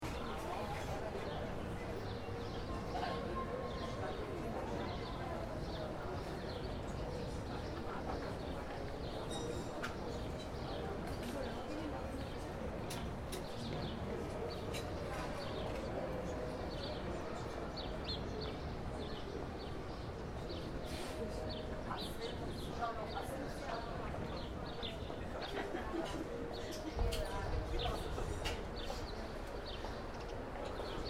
Light European City Ambience, Mid morning Warsaw, pedestrian area; pedestrian area cafe, occasional distant tram; City Sound Effects